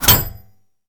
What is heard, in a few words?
Bullet Impact Metal Light; jasem just another shooter with electronic music windows 2017